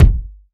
steel kick drum g key, steel kick drum sample tuned to the G note; kick drum samples